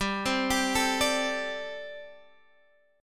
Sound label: G Suspended 2nd Suspended 4th, Guitar, Gsus2sus4 chord; arpeggiated; x,10,10,12,10,10